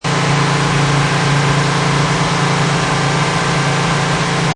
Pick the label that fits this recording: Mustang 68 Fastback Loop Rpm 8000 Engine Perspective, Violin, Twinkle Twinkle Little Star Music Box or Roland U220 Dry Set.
Mustang 68 Fastback Loop Rpm 8000 Engine Perspective